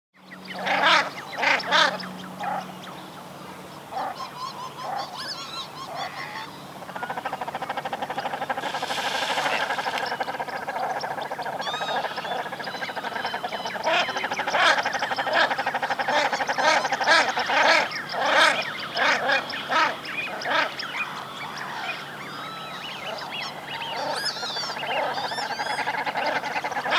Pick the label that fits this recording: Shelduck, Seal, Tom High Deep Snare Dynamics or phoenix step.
Shelduck